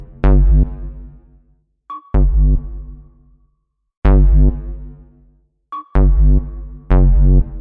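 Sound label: like sonar bassline, 126 bpm; SYNTH AND ELECTRONIC LOOPS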